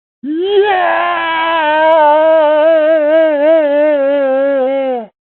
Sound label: fall